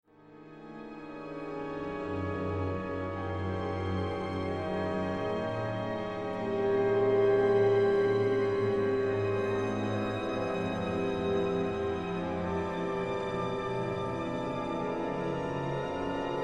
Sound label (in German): Hymnus Cantus firmus in den Violinen; Cerha